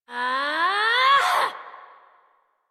Exert Adult, Melee; Warframe; Duviri; Drifter

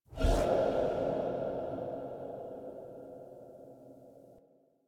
Toggle Shard List; DE; Warframe; Helminth Shards

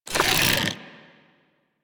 Bow Draw

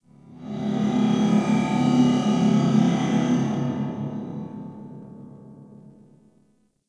Galleon Low Ship Rand Amb; Warframe; Ambience; Grineer Galleon; Galleon Misc

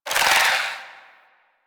Scarab Swarm; Warframe; Mummy

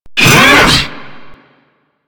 Hammer Boss Exertion, Vocals; Warframe

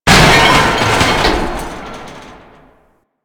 Destructable Vent Cover; Objects

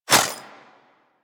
Throwing Star Whizzby; Warframe; Weapons

